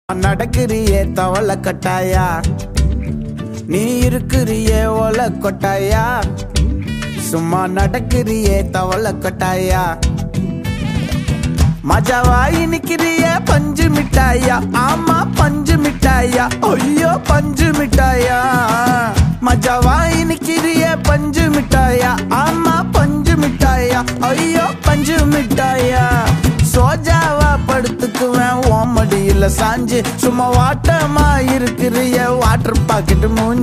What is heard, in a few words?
song bgm ringtone; raayan